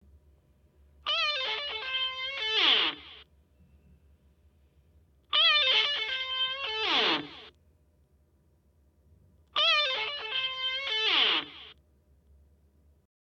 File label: Rock Guitar, olofonia; L'olofonia è una tecnica di registrazione e riproduzione audio eseguita tramite uno specia